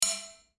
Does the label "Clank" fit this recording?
Yes